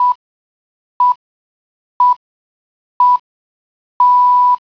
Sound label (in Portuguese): Sinal Horario